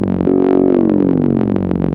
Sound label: Serge Distortion, Serge Modular drums